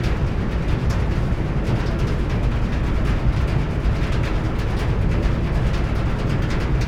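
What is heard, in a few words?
indoor loop; Garry's Mod; tf2; cp harbor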